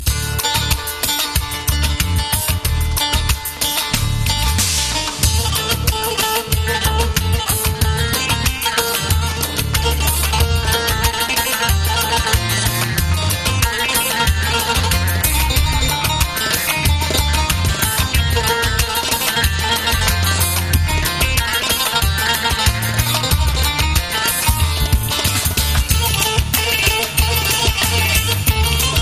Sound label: pancar pezik saz